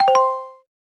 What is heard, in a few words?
new Mail